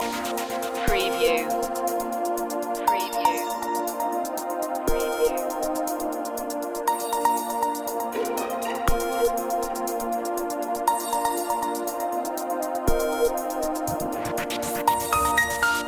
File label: radio waves loop